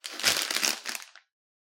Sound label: crumbled paper; soundscrate